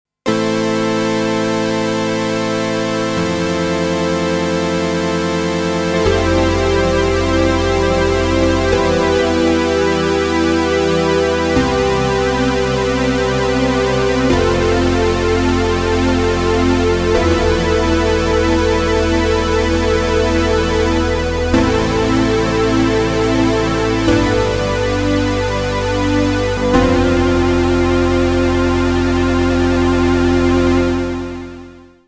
Juno 60 Chorus Pad

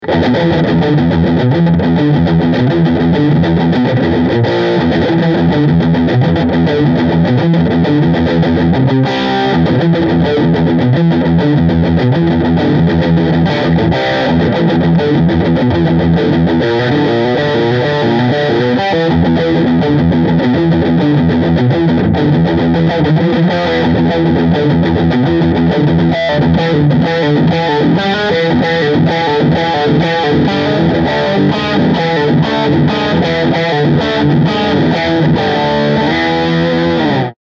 Peavey Solo Full Overdrive Scooped

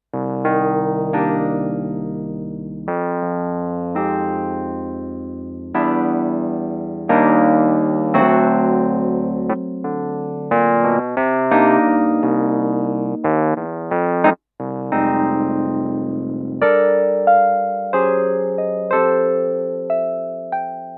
Kurzweil Forte Vintage Amp

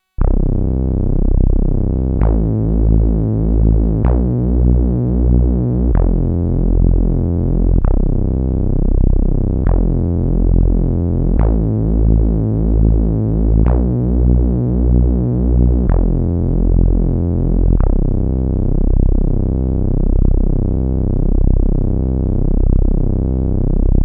Modal Craft Synth 2.0

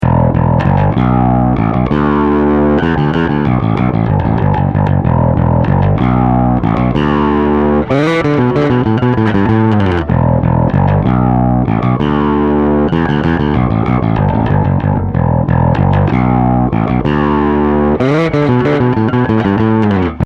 CompDrv

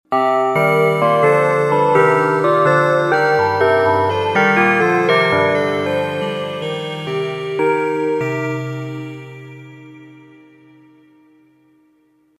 G2 Metallic

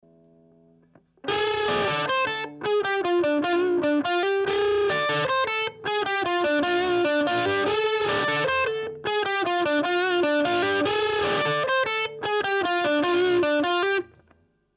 Standard HP Gain Neck

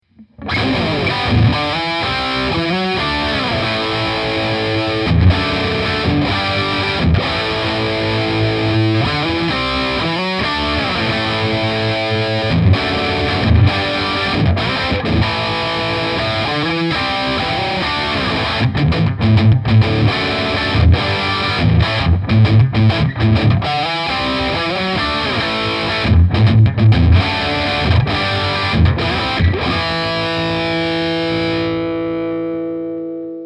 Boss Katana Artist MKII Brown

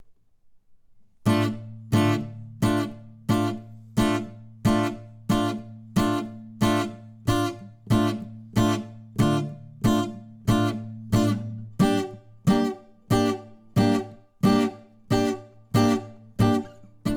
Acoustic Guitar Attack Release; GRL9

